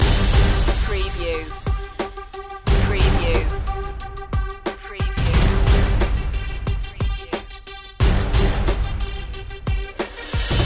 epic title underscore loop

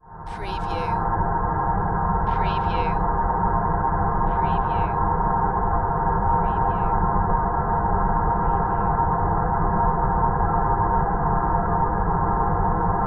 Drone Airy; Sound Effects Bible

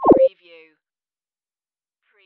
future

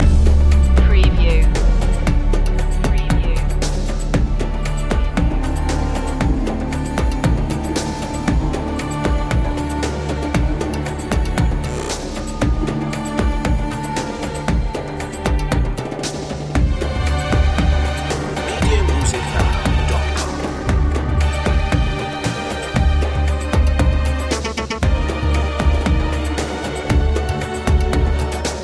middle eastern remix

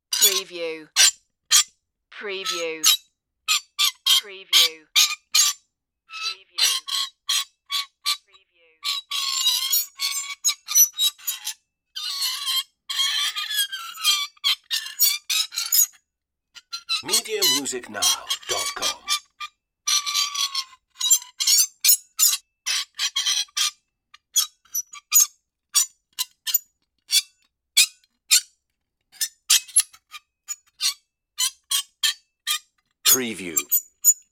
painful metal squeaks

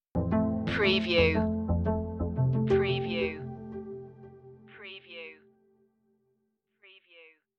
under the lights stinger

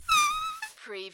CARTOON AIR ESCAPE SQUEAK

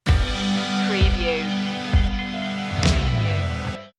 slow stinger

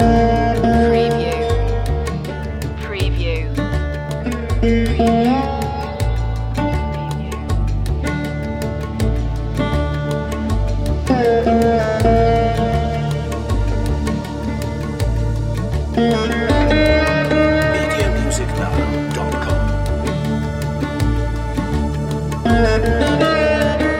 sarod and flute raag bhairav loop

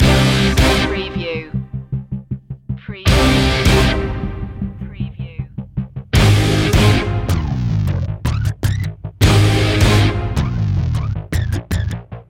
drama heavy loop

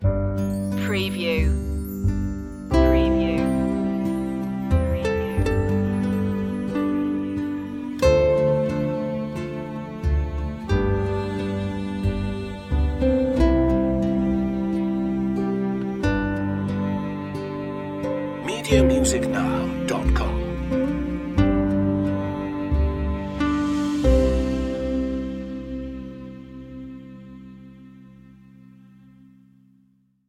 sensitive calm

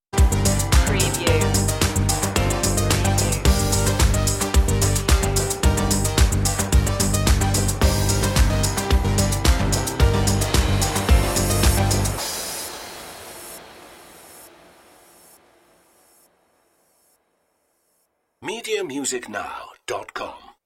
corporate machine; media music now

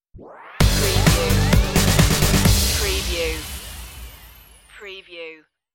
glider stinger

